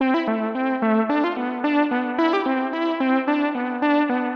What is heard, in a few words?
Synth 110BPM; sci-fi